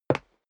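step dress boots wood parquet single right, footsteps; schritte; menschen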